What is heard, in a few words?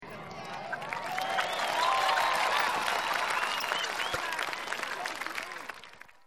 applause